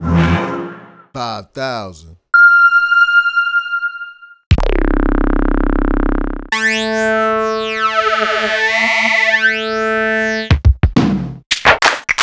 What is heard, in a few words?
Akai MPC5000 Internal Rom